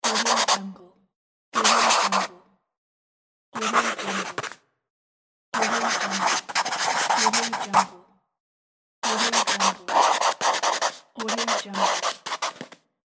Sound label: pencil writing; mountainaudio